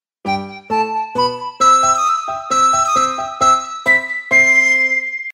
short blast, notifications; Bluboo; Xfire-5.1

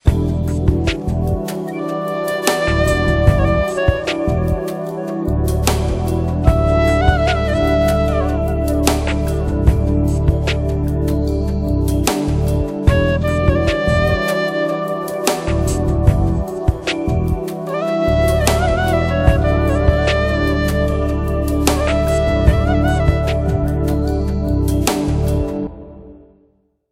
Flute fantasy; Micromax W900

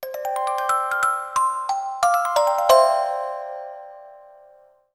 Alert; Samsung SGH-i320

